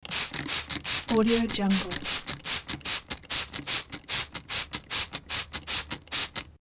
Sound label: spray bottle pump action; hollywoodedge